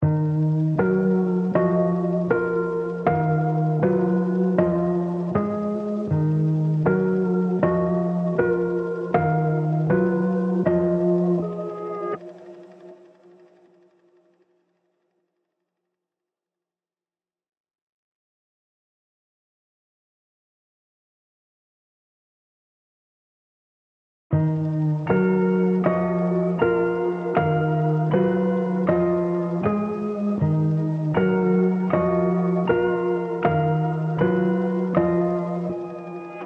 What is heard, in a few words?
158 BPM D# Min Pluck; Melody Loops; Hip Hop; Cymatics; Barrel